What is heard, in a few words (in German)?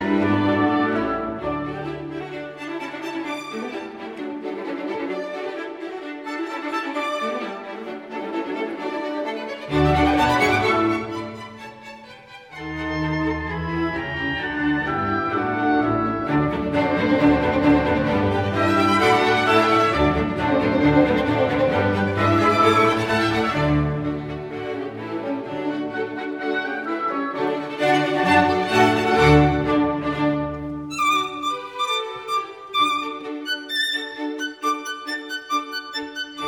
violinkonzert nr 4 d dur kv 218 allegro